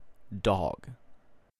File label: Dog